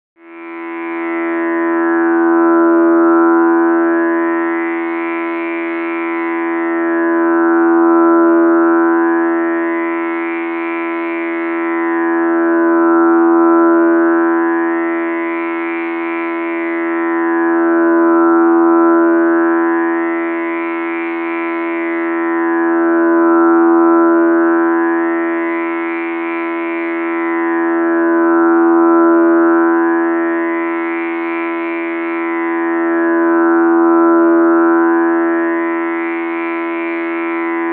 Buzz High Pitch High P, beeps & tones

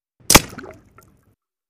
Bullet Impacts Water

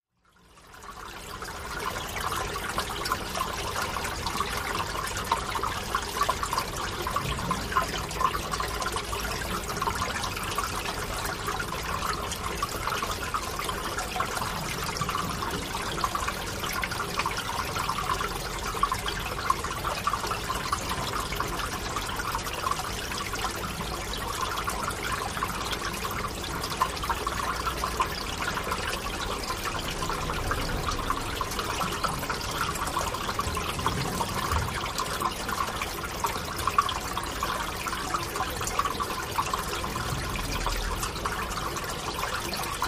Water Brooklet Under S, streams

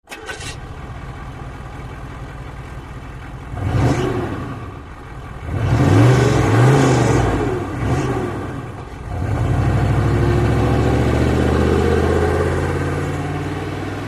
Humvee Slow Start Rev

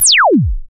laser; lasers

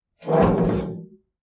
metal gate latch; latches & bolts

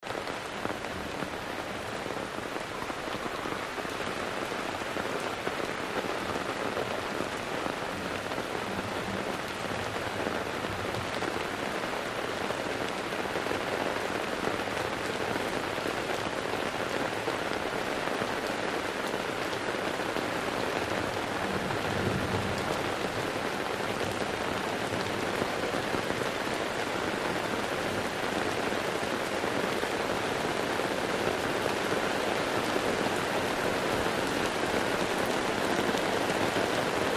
Rain from inside tent, increasing

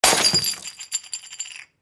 Drinking Glass Smash

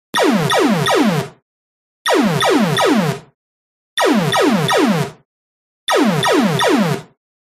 Bursts Revolving, beeps & tones

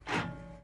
Gear Grinds

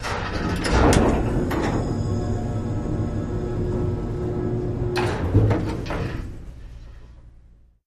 Utility Elevator, elevators

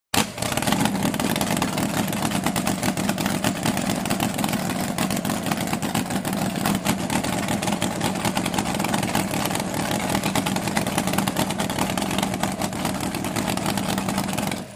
Drag Boat Idle off

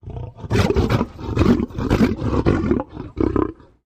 Lion Growl Rapid Thro; big cats

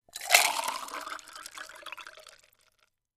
Water is poured into drinking glass.; Pour, Water Liquid, Pour Glass, Water

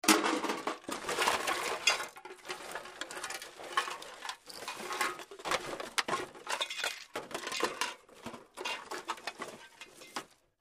Wood Search Through Garbage or Junk Pile

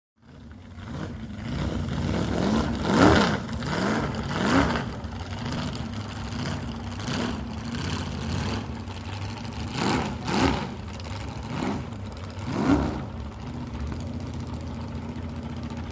Drag Boat Racing Amb

